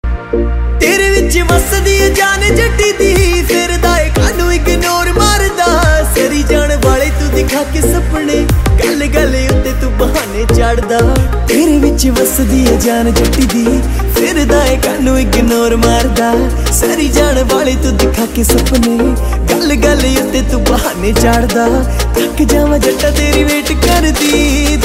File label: punjabi ringtone